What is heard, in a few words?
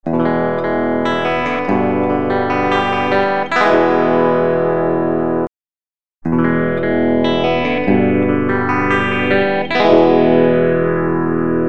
phase shifting